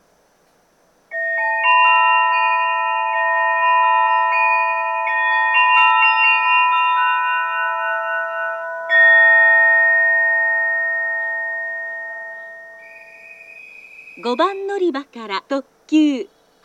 takaoka limited express hakutaka echigo yuzawa, station; 高岡駅 5番線; 発車メロディー; 特急; 特急はくたか9号 越後湯沢行です。; jr-west